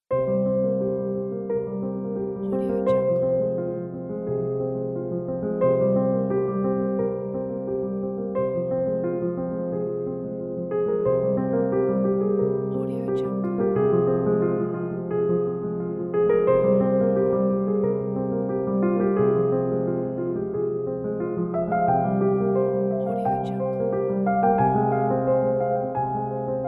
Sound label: classical sad piano